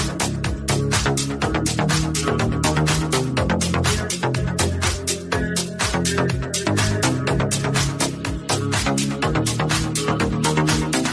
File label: English BGM Ringtone